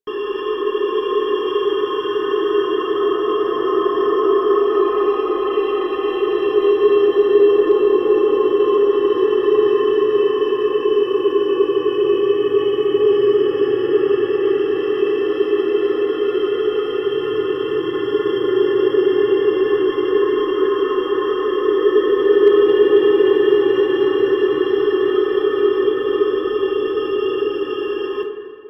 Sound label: Space Exterior Amb; Ambience; Space Battles; Warframe